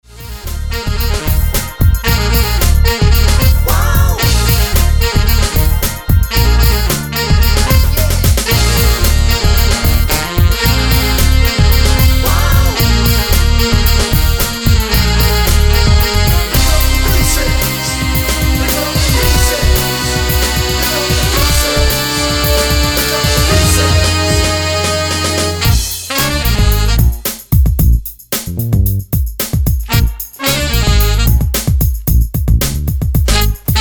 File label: Horns Included Vocals Included Key Of F